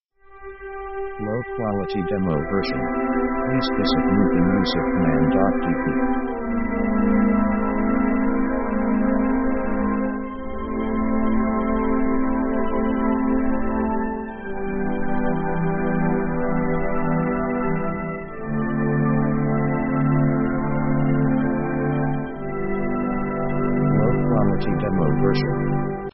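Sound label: orchestral love theme sketch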